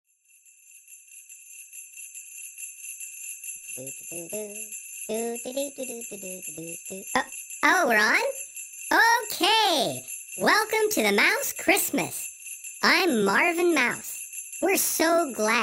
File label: Vocal; Marvin Mouse